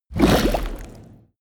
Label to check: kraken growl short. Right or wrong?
Right